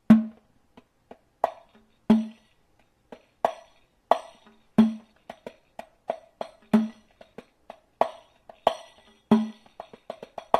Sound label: nawakht riq